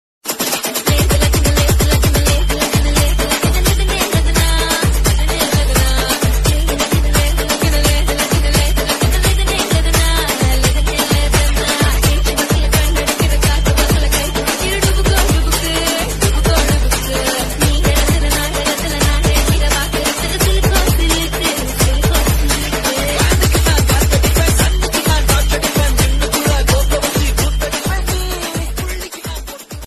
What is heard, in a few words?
fast beat bgm ringtone